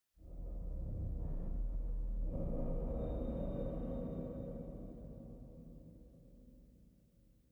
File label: sting metal groan; Rust